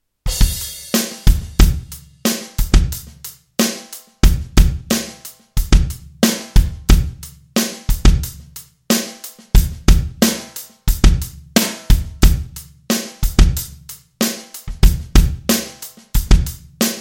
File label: Drum Track